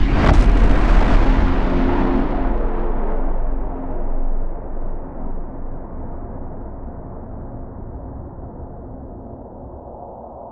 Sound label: Singularity Collapse; Thalassophobia Restored; Jobgear; Scientist